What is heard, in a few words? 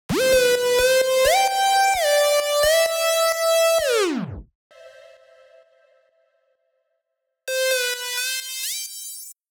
Synth Harmony